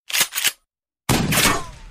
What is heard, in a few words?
gun sms; games; ringtones